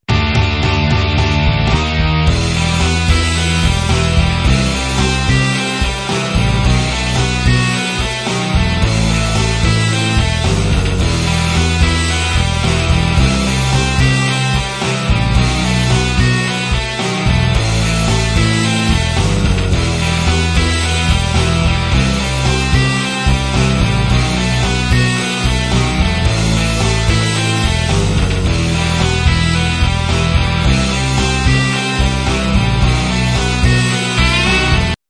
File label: sweet solo